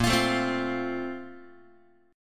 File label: Bb Major 7th Suspended 2nd, Guitar; BbM7sus2 strummed; JGuitar